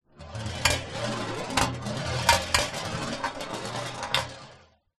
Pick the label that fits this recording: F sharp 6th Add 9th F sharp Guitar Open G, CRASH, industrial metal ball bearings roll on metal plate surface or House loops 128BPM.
industrial metal ball bearings roll on metal plate surface